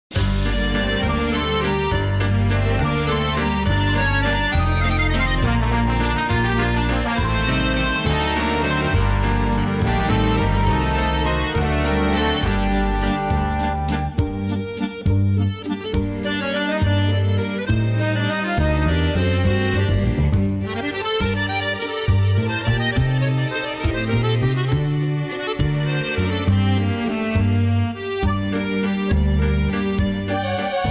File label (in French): mix instru